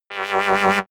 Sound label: sci-fi scanner wobble medium; energie